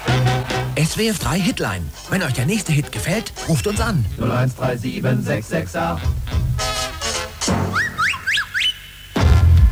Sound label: jingle; swf3 hitline